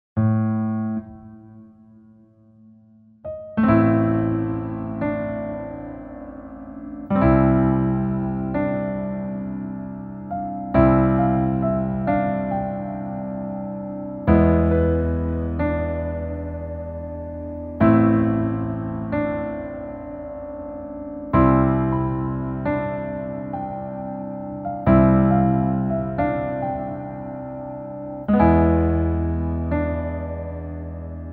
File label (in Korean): Piano ver, 멜로디MR